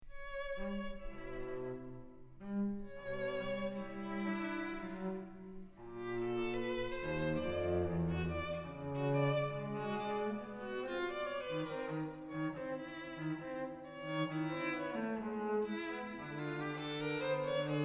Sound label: piano trio excerpt; for piano, violin, and cello, '32 sec excerpt